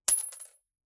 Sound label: coin; sling driver